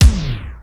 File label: MPC 60; Rubber Chicken Software Sample Bank; DRUMS + PERC; AKAI